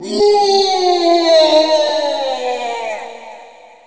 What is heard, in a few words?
fall man; Nintendo